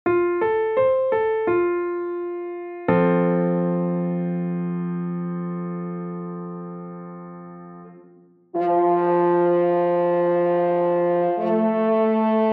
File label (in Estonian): Metsasarv; f-duur